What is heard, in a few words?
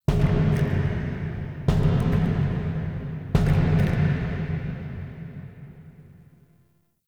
Knocks Large; Ambience; Warframe; Entrati